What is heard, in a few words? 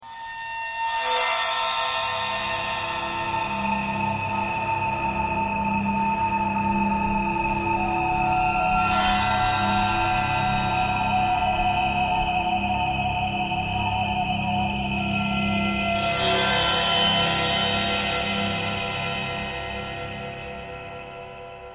traffic k core birds ice bowed cymbal thread pentatonic; bowedmetal